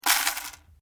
Container Plastic Filling Dry Food Stuff, Kitchen container; Materials